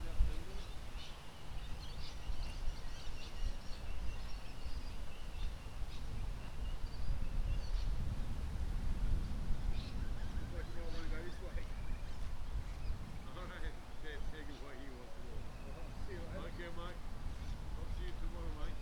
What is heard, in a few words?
park ambience wind